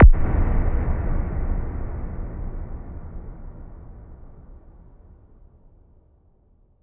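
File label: reverse kick; Best Service Alpha Dance vol.2; ZAPS+THUMPS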